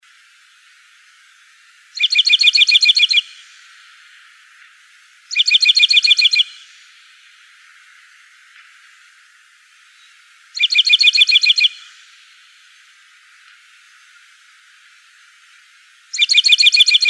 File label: CANT, Emberizids; deviche